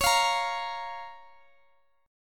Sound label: Db 6th, Guitar, DADGAD